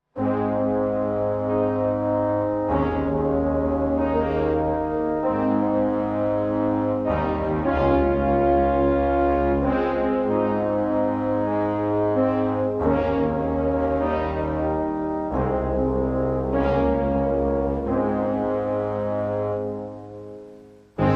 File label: No Drums No Lead Trumpet